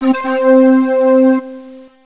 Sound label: energy